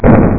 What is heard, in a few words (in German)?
BOMBE; MRUWURM